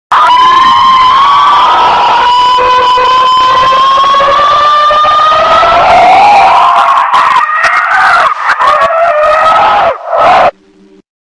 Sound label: really happy mouse slowed